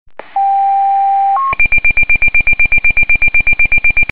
firefighter pager

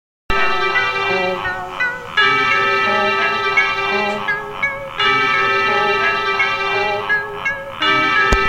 evil strings

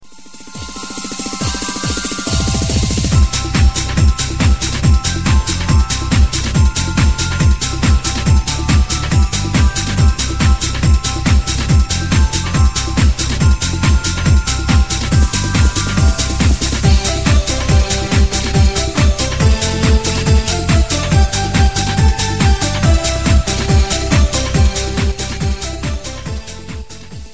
jingle bell beats; ringtones

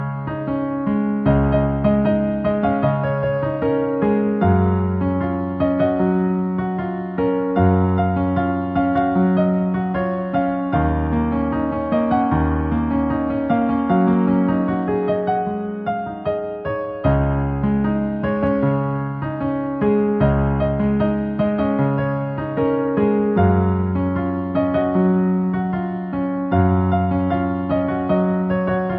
Piano

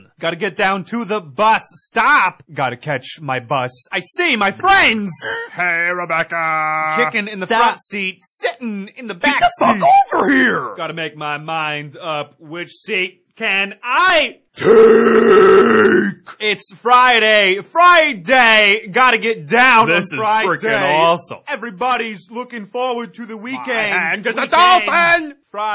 Is Calling Ska, ringtones

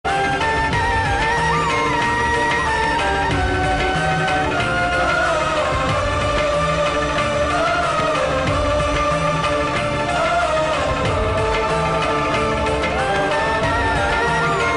thank you flute loud

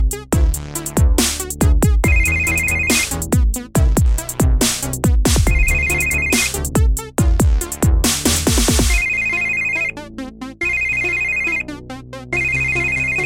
Dubtec Ringtone

